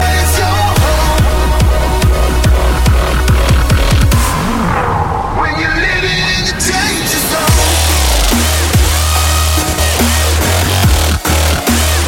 dubstep remix

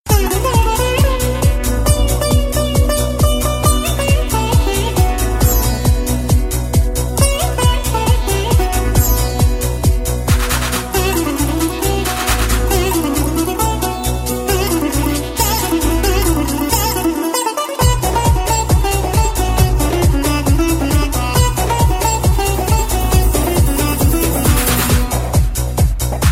classic sitar mix

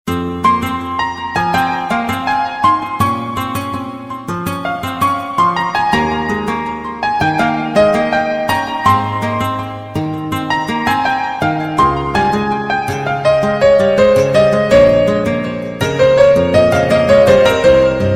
romanian piano and guitar

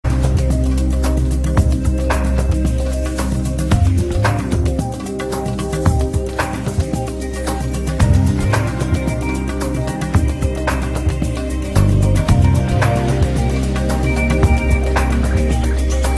instr

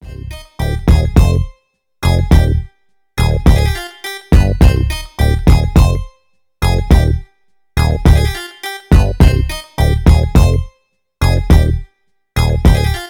instrumental, ringtone

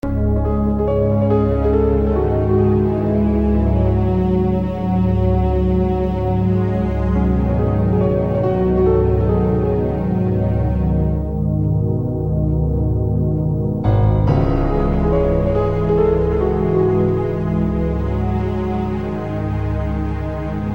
piano theme; resident evil 2

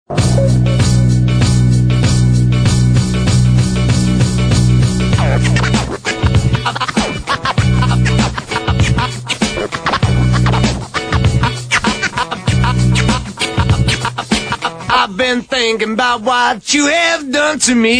scratch tone

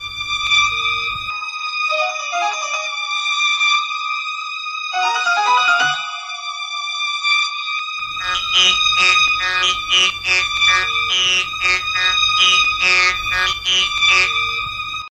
horror space

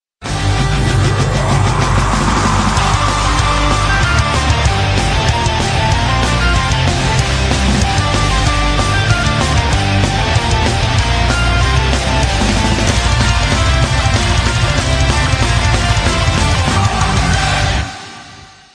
folk metal

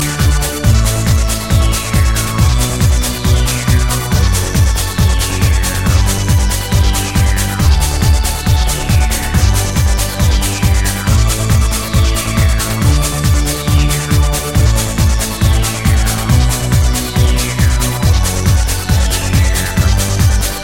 morning classical

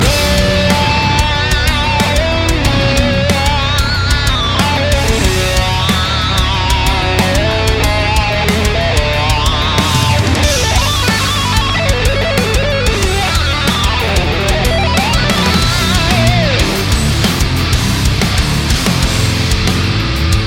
metal solo

